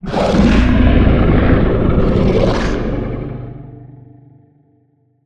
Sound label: Low Roar Strong, Monster Sounds; TheDeclineOfWarriors; Monster Sounds Volume II